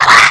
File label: SKULL BAT attack; Eft